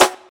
Airy Sizzle Acoustic Snare F# Key, snare drum sample tuned to the F# note